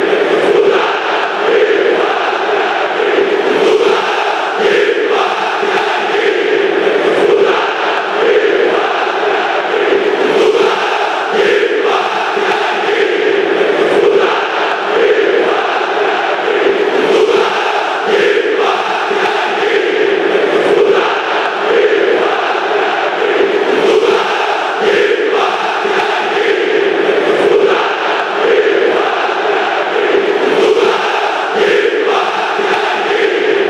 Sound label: fanchants, greece; poutanas gioi